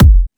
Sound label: Trance Kicks, Bassdrums